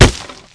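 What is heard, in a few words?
grass; Mafie 1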